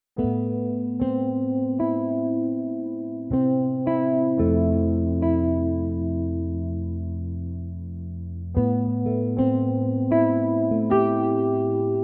MODERJAZZ

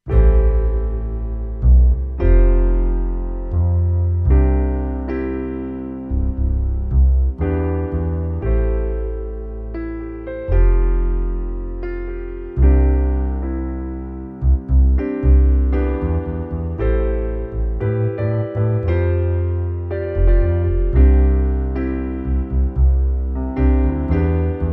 KURZWEIL PC3; CONNECTED

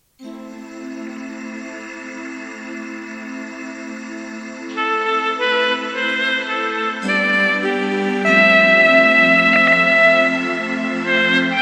VERY SAD THEME

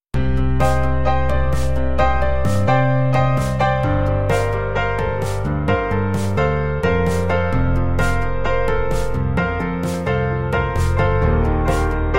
GRAND IMPERIAL

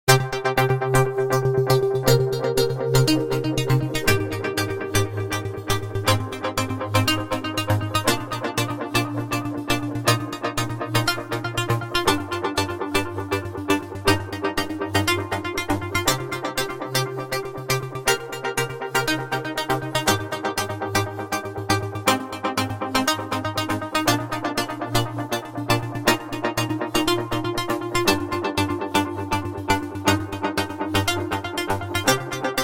FM7 EXCITING

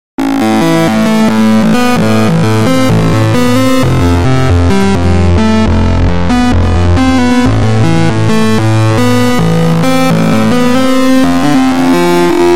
DIST ARP; VAZ

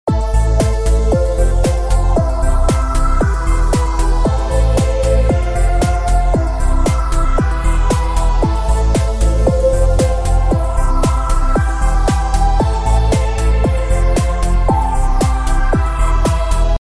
SYTRUS CLOSED

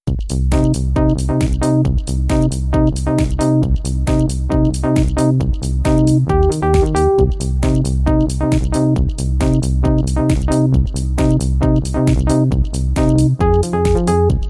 BASSLEAD; PLG150DX

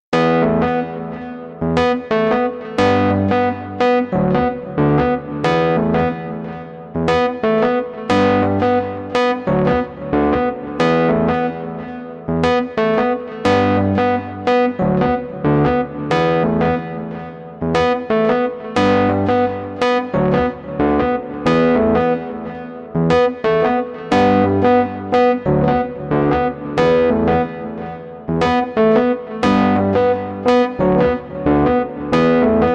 EPIANO1

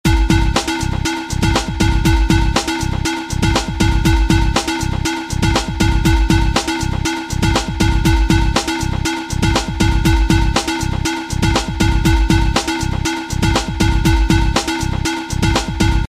TRANCE

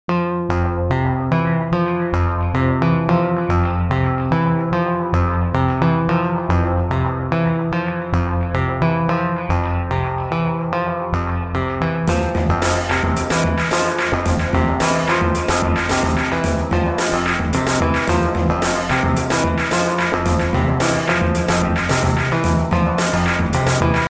OSIRUS FUORI TEMPO 11 8

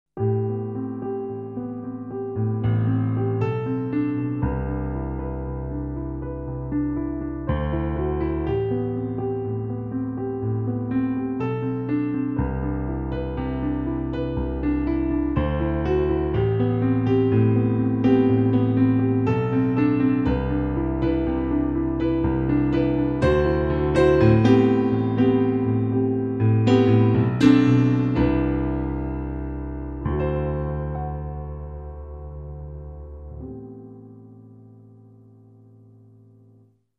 PIANO

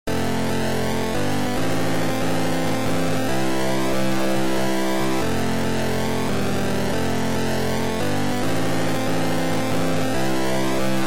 1BIT EXPLOSION

